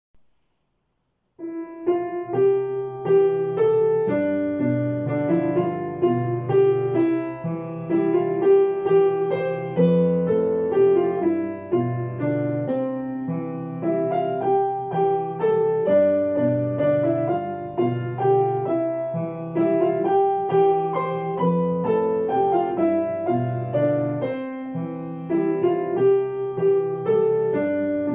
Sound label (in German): Klavier